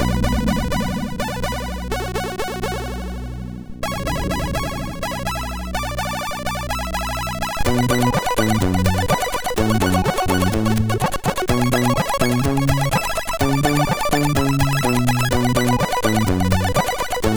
Disco, MOS6581R4; Mars

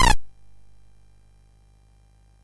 Project Firestart, MOS6581R4

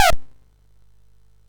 MOS6581R3, GAMES; Spikes Peak

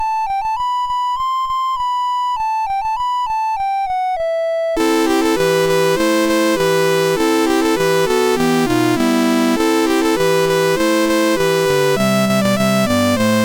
Noel des Oiseaux, MOS6581R3

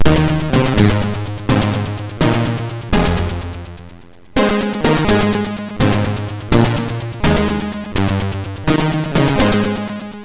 sid MOS6581R3, GAMES; Cisco Heat

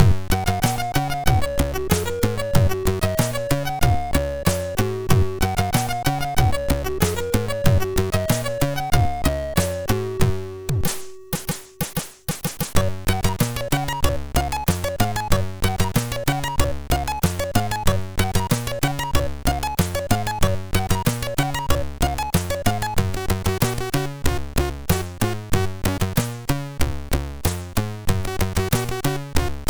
Reggae, sid MOS6581R4; Signor

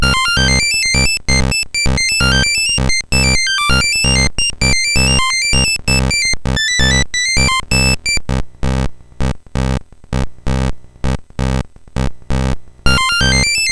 Calypso, MOS6581R3

